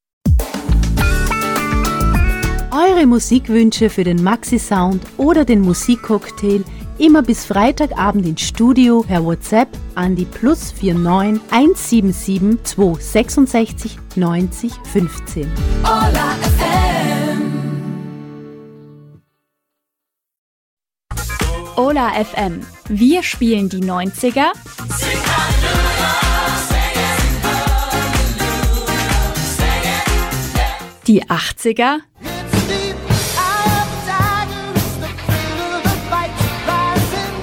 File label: Jingles Audio Logos Audio IDs